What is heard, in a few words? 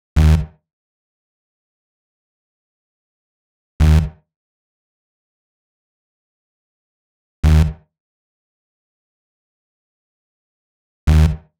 132 Em Bass, Bpm132, Complex Electro Synths